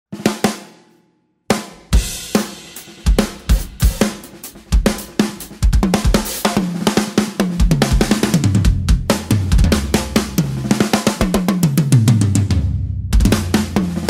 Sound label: drums set